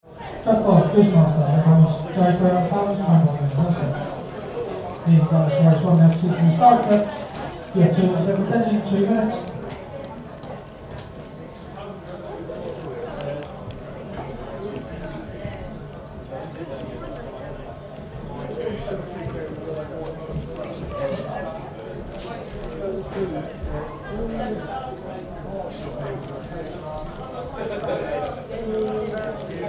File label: Greyhound Stadium Bar Crowd, Muffled tannoy - background aircon; background aircon interior; Racing; Tom Hutchings